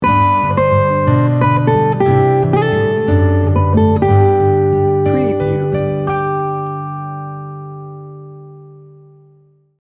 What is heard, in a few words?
warm and tender sting bumper